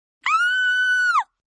Scream Female Short; Screaming; Sound Effects:Voice

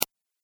Pc Mouse Single Click

